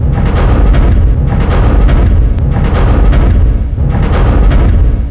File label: crusher minerals